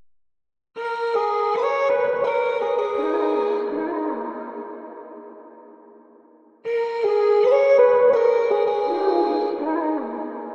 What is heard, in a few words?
Vocal chop, 163 G#m; Charmer; Slate Digital Omnivox Vocal Sample Pack; Chops